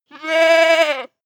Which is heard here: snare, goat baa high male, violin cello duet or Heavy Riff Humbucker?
goat baa high male